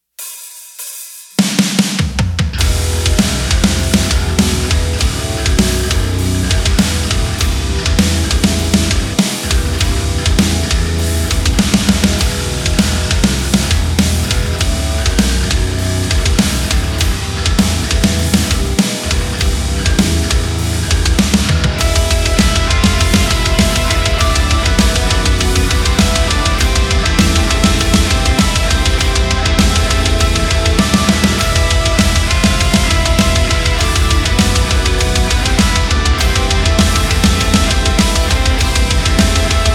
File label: metal remix instrumental